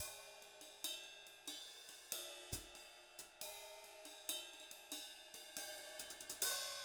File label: 70bpm Cinematic